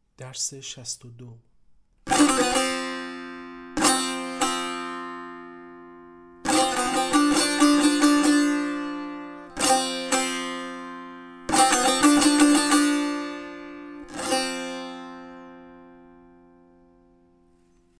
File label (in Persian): مشق تنبور